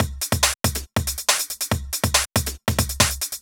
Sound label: Break Beats, 140 BPM; Vengeance - Essential Clubsounds Vol.4